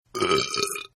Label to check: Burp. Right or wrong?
Right